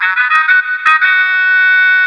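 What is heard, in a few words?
charge, horn; model 336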